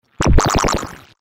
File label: hero powerup